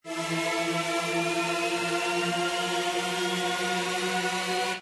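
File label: respin Sound; jackhammer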